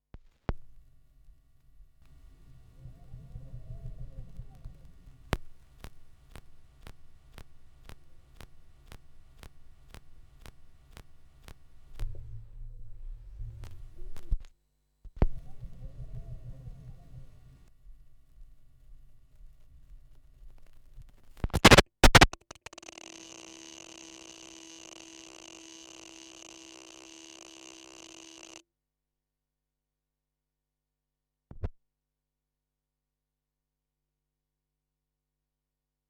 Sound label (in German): schallplattengeraeusche, Vinyl